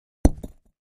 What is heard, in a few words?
Patio Block Impact, concrete & brick